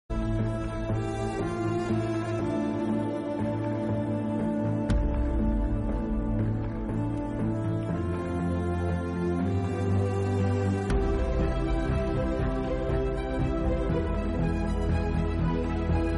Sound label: Sad Bgm; Krack